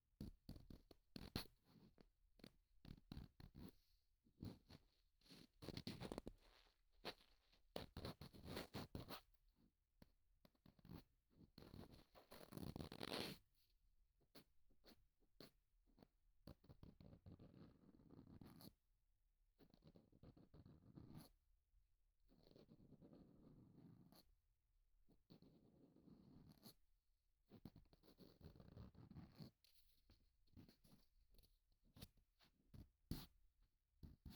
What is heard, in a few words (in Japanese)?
綿棒 外, 耳かきに関する音; 3DIO